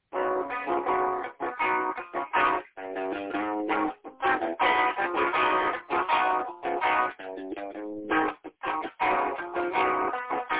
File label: repeating groovy changes